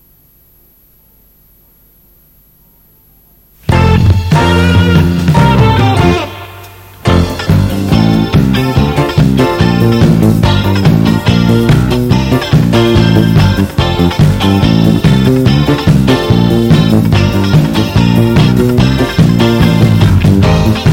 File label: Instrumental